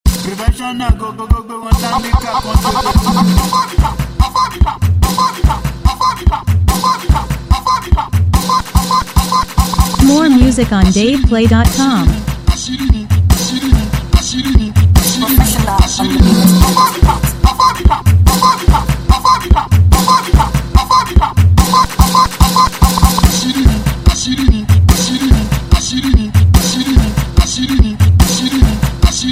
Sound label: Professional Beat